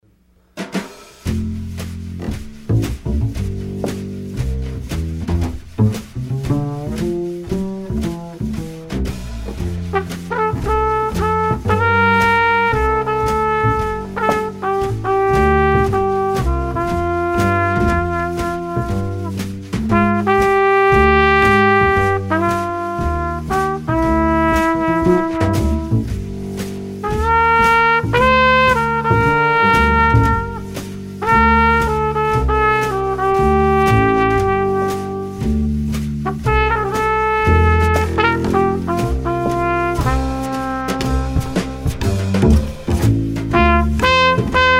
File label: Acoustic Trio